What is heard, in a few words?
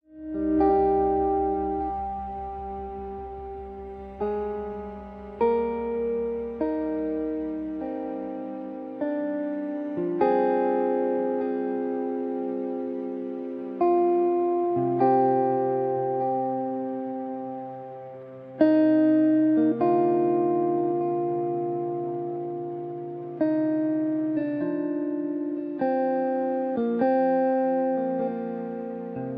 Exploration Waiting Main 100bpm 6bar; project zomboid